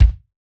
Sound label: Jazz Kick; Roland BK-5